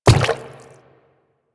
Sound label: Quadruped Medium Walk Water, footsteps